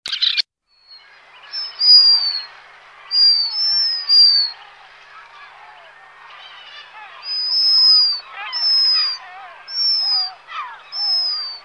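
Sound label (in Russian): chistik golos, black guillemot voice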